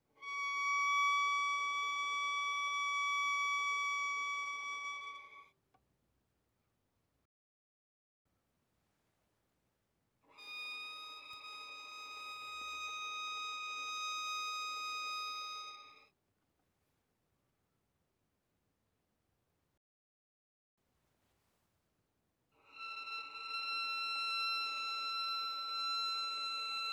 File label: Violin arco mf sul A Db6 Ab6